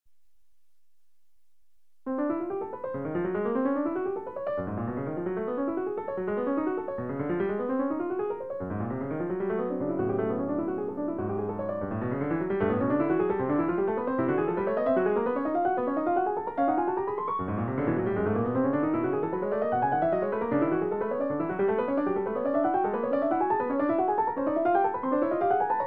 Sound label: piano solo music, modern